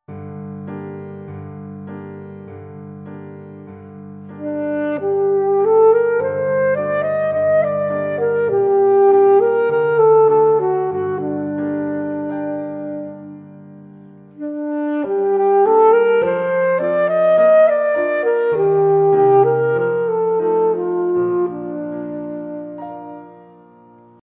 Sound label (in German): altsaxophon klavier; hofmeister verlag